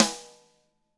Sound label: DW RIM FD-R, DRUMS SNRD; ILIO; Double Platinum Drums 2